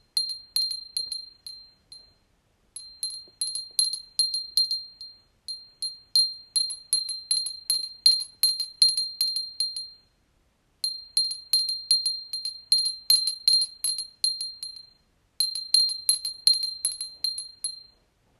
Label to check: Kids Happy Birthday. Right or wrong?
Wrong